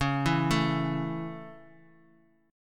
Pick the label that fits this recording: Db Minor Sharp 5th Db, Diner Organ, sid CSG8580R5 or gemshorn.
Db Minor Sharp 5th Db